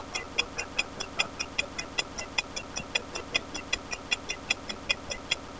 clock

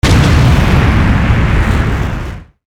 blast

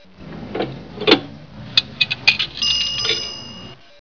payphone coin drop

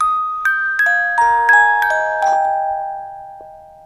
musicbox

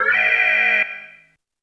red alert; trek

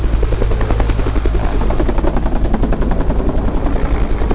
heli

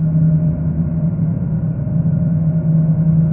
hum

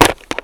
clunk

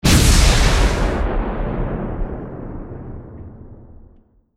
cannon blast

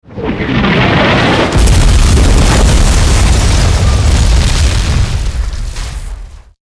dragon breath

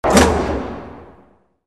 machine stop

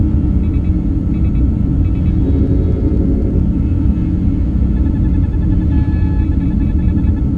shuttle interior loop; trek

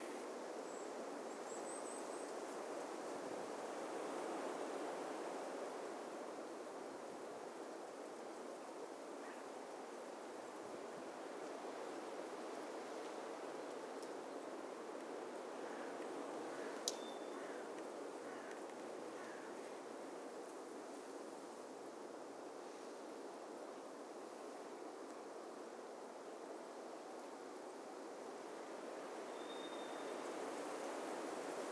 forest

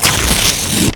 tear

